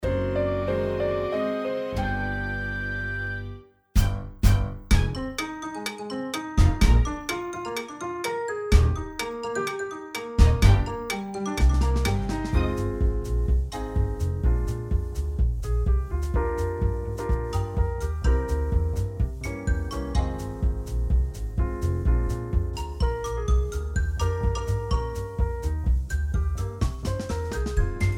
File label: BACKING TRACK; ADIOSES SHOW CHOIR; THE CHRISTMAS SHOW; LA LA LAND